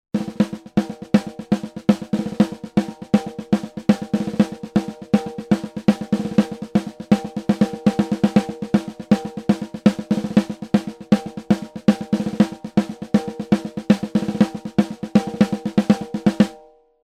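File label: sE Electronics V Beat SD 45° Winkel, snare drum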